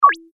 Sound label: button click; Danger Mouse - Ultimate